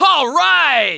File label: celeb